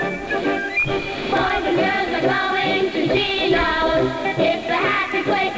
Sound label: kids song